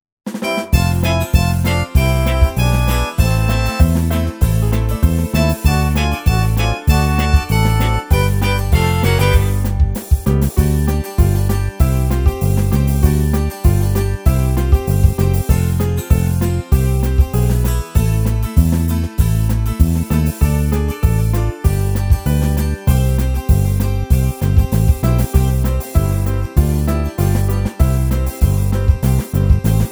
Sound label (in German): Karaoke